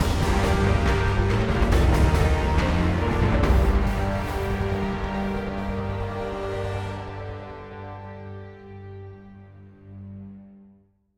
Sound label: Boss Combat End